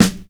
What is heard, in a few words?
smooth acoustic snare f sharp key